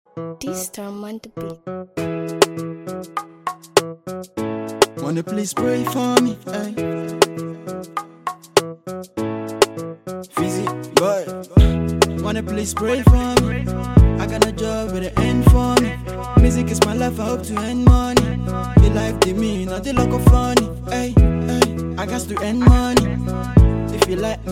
cover ringtone, english ringtones

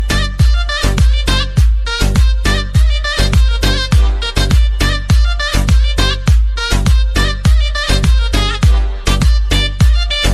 english melody ringtone

